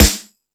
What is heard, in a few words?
Hip House, drum machine